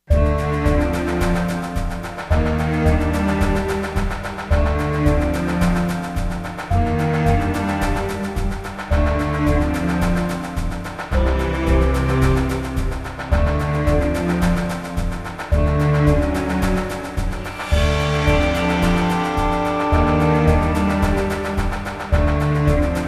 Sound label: Documentary; Trials